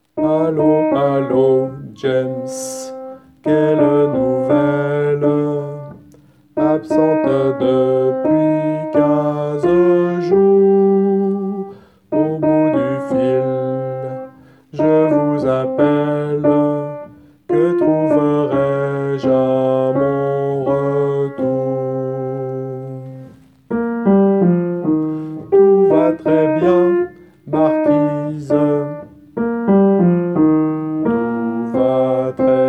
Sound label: alto